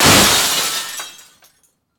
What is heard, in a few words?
Crash Glass; Blastwave FX